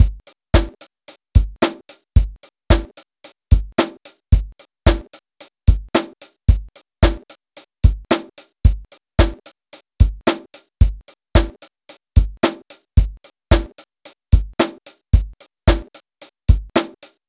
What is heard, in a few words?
Drum Kit, Electro Lounge; Sonic Foundry (Sony Creative Software); 111 Hotty